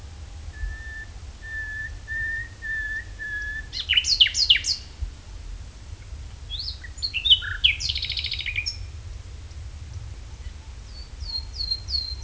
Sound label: call, Nightingale, The whistling syllables uttered in accelerando; luscinia megarhynchos; are distinctive of the species